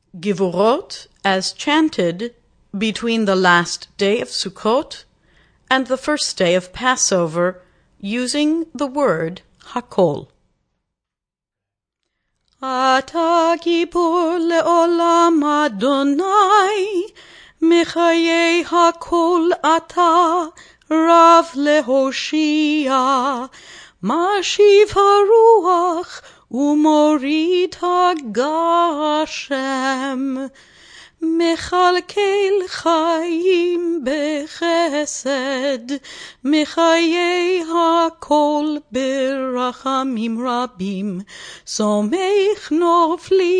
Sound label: gvurot hakol chant